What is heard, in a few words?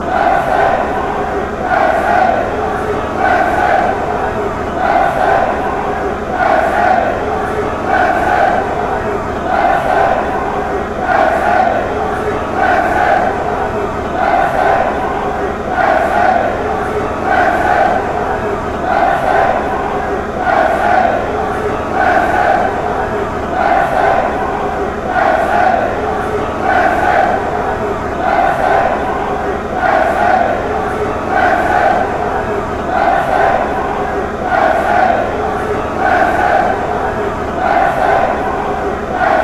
genk chant, fanchants